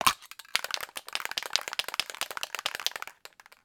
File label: Remove Lid Shake; Cars; XBOX; Ambient